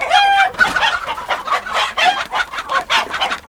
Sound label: chicken long; Ed Edd n Eddy; Adromulus Pack; EEnE